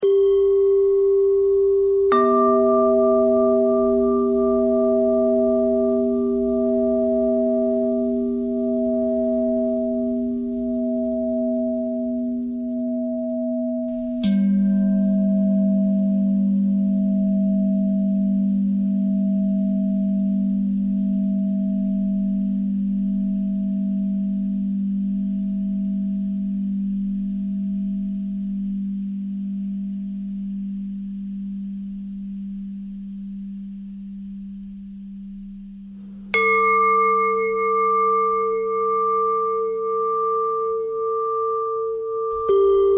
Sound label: bell bell thread noise